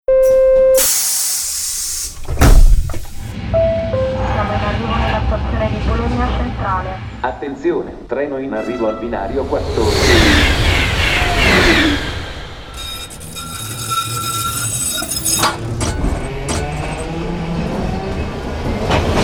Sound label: Rails; Sound of Italy